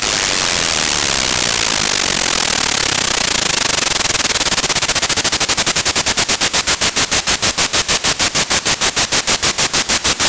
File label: flac noise; Version Flac